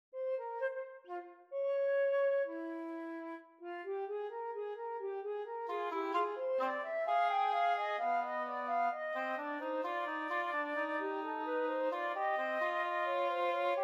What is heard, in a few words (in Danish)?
Fuga i f-mol woodwind